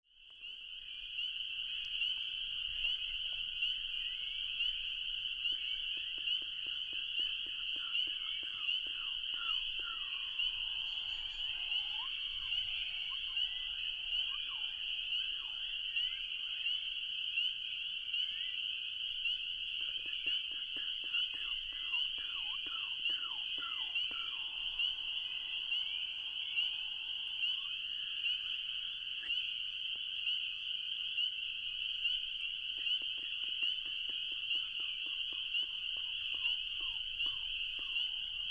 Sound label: Mouth Sounds Night Lake; Wisconsin 2019